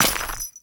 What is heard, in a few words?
Step Dirt, Footsteps; Warframe; Grineer; Royal Jester; Foley